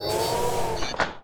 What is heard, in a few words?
draw, weapons; dystopia; basilisk